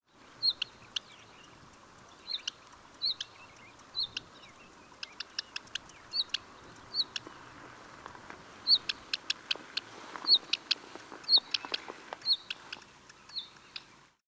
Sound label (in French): rougequeue noir